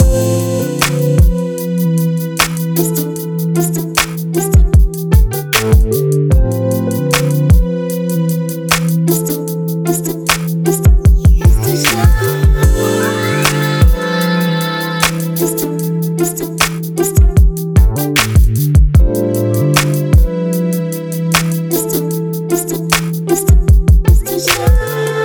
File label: Beat 86